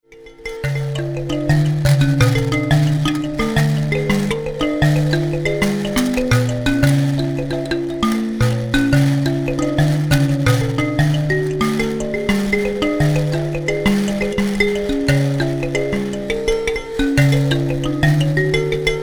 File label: mbira